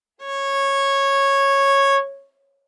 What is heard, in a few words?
Viola arco ff sul A Db5 stereo; MIS Pitches - 2014; Strings